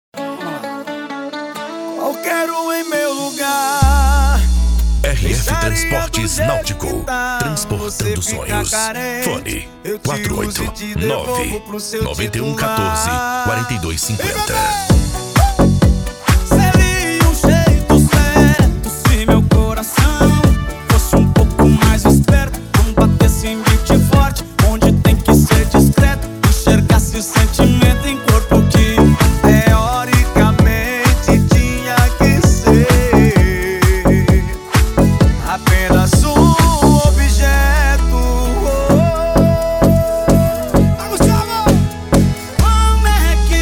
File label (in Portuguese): especial sertanejo